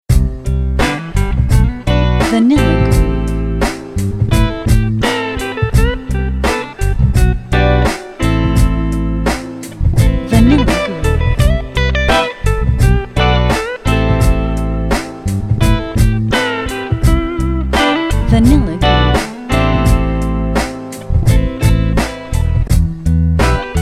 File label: Boom Beats n Bits